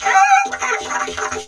Rocket Chicken

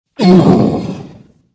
Pit Lord Wound

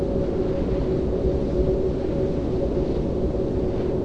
Pre Cast Nature Magic High; Spells

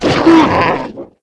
Saber Worg Wound

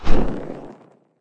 Phoenix Wing Flap

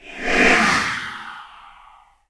Ghost Attack

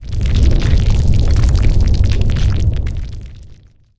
Earthquake Camera Shake; Spells

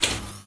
Gnome Spider Tank Footstep

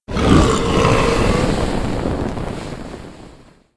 Goblin Breath Chili; Spells

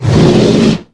Yeti Pre Aggro

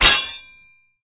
1h Sword Hit Metal Weapon

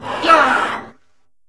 Clockwork Gnome Attack

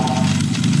button Control Unit Close; Doodad

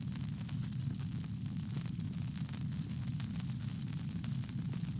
incense burner; Doodad

